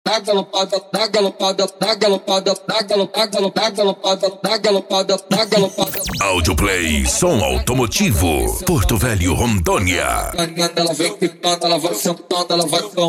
esp funk mega bass

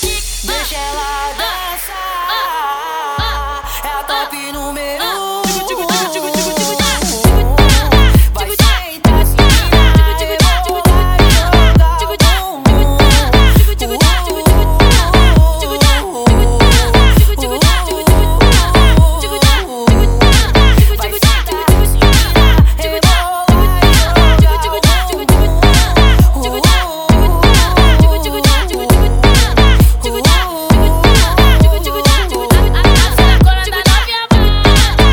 hip hop mega funk

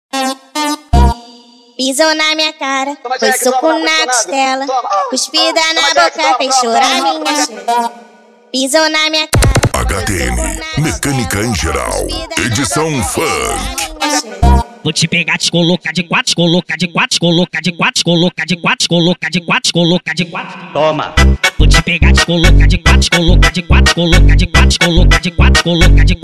mecanica em geral edicao funk

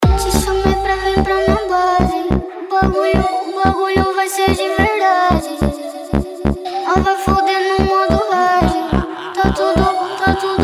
funk agressivo ritmado e funkadao

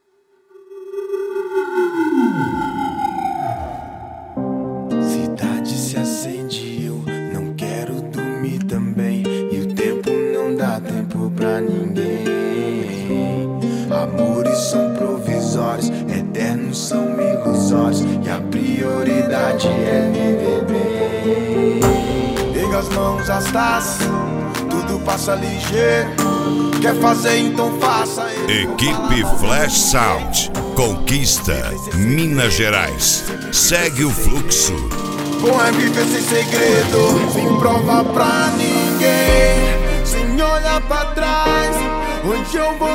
rap; cd rap flash sound

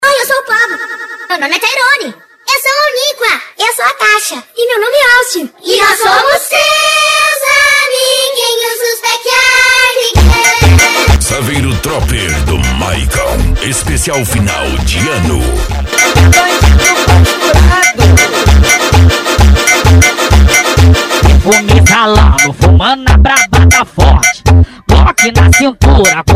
funk agressivo funk

